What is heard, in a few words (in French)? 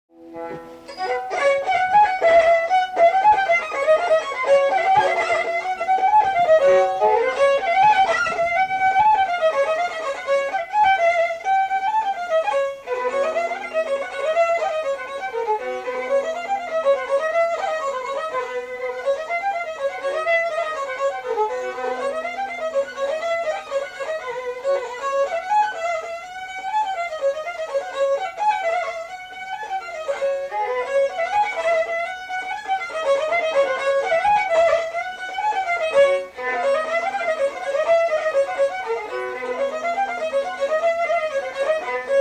bourrée